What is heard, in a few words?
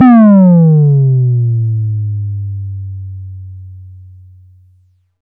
SYNTH TOM C3; Best Service Alpha Dance vol.2; SYNTH TOMS